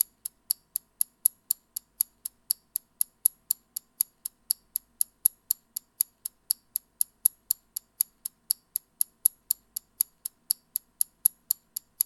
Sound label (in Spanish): Cronometro